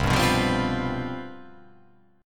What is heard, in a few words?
C Major 7th Suspended 4th, down